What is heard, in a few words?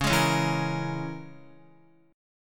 Db Minor 6th Db down Guitar; Dbm6 strummed; JGuitar